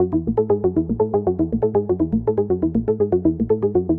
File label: SH101 Arp (120BPM), retro house